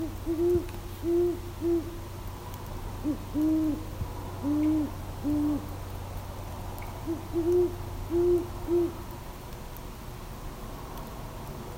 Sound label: Great Horned Owl, Bubo virginianus; owls